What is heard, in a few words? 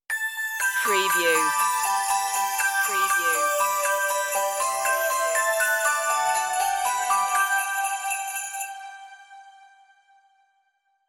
merry christmas synthified stinger intro